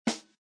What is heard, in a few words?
snare mic, drums